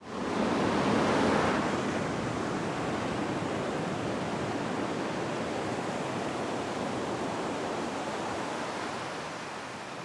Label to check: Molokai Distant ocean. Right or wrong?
Right